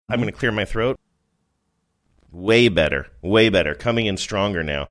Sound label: im gonna clear my throat way better